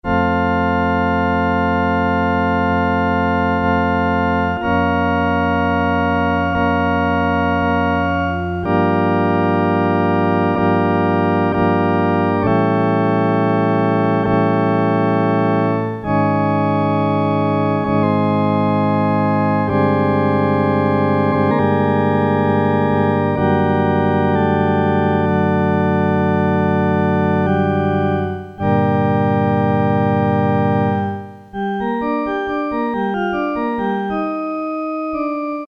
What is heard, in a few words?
pipe organ, Casio SK-1; Factory sound